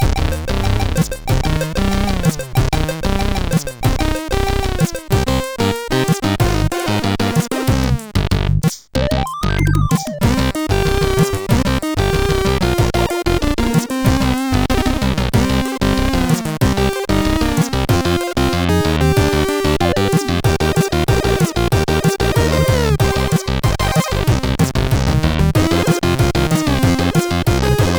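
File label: MOS 8580 SID chip music, games; CSG8580R5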